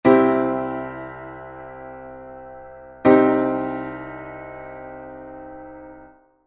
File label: Comparing Major and Minor